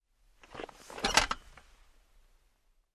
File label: Sit on folding chair